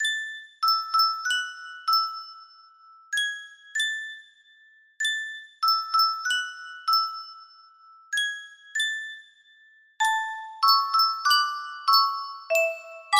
Yunsheng music box melody

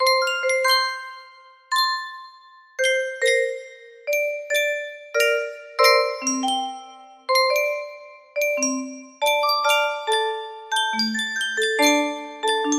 lullaby, music box melody

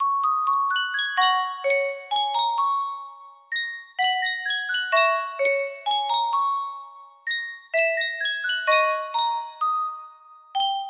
Sankyo Music Box, music box melody

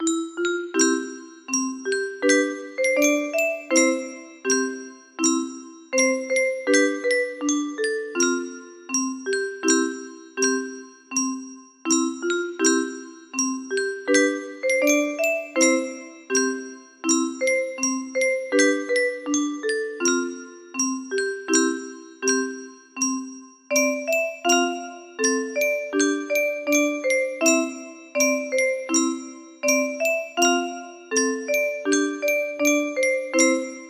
melody, music box

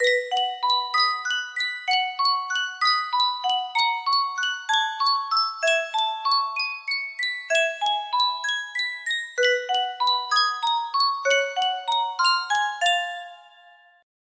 yunsheng music box, music box melody

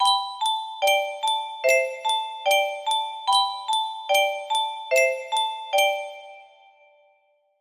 music box melody

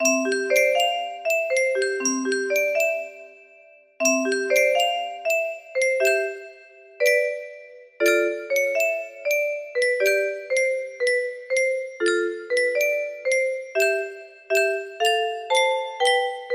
orgel version, music box melody

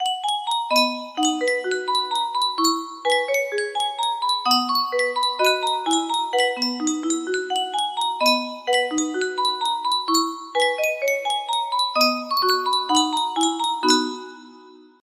music box, music box melody

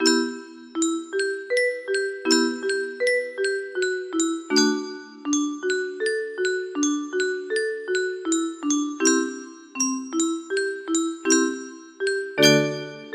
music box, music box melody

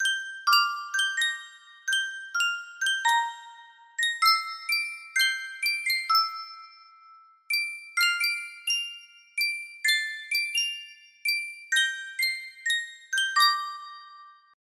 Sankyo 12 note music box

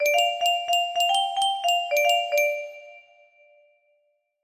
melody, music box

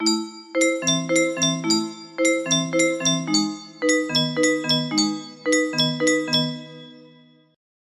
waltz music box

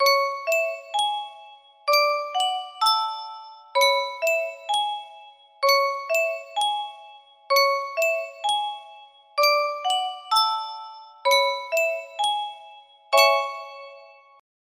yunsheng music box, music box melody

